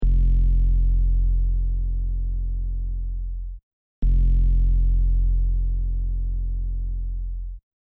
sabbass serum

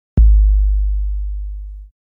808 kick

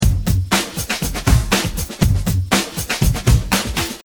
loopmix

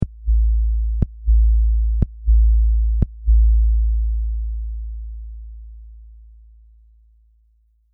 sabbass kick

